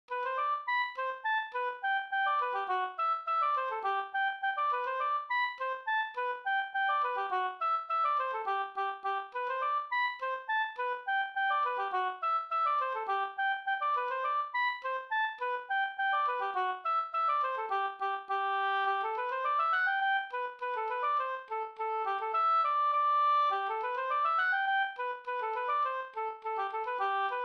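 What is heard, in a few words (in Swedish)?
polkett, G major; e Spelare-Johan Ol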